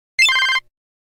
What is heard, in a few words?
Basic Ring, Business Rings